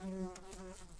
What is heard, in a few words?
Humilis workers, bumblebees